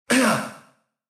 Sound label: pain